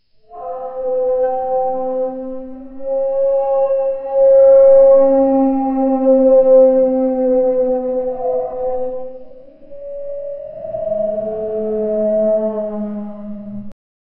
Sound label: evil dog howl with horror